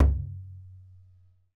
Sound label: MADAL, DRUMS OF IND